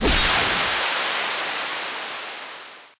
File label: Smoke